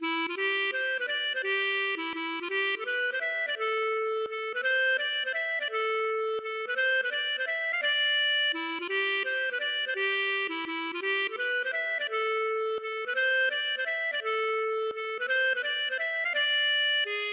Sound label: mazurka